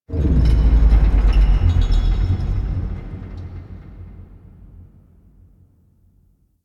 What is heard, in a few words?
Ship Thrusters, Ambience; Warframe; Guild